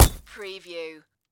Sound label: Bullet Windshield Hit; sound-effects-bible